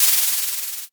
scroll open

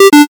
error

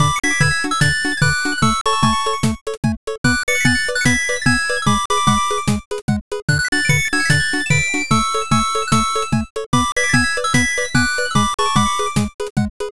bgm bright

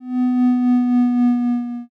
boo

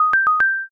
washer done

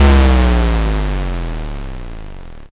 explosion

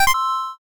save

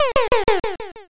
laugh single

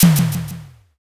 pistol

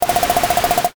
gear

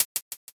hihat

